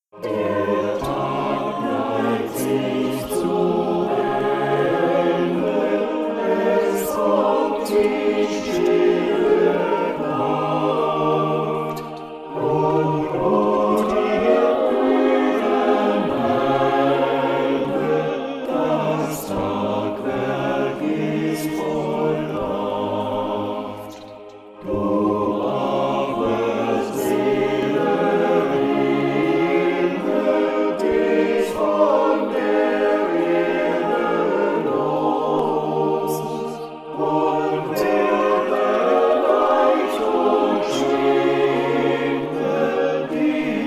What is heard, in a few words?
satb playback hall; der tag neigt sich zu ende